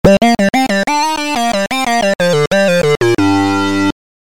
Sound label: sync solo high; obx; opx vst